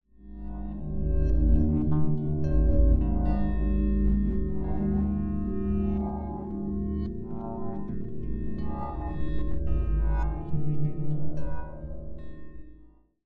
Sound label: Bells; Pasiphae; NeverEngineLabs